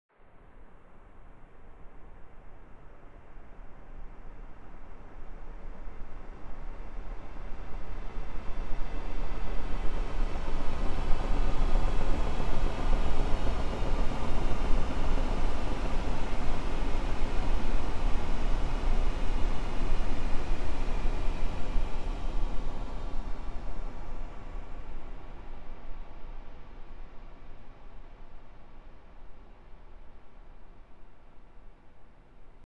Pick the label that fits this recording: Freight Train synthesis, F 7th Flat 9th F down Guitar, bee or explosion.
Freight Train synthesis